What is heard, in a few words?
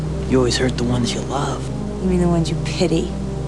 Hurt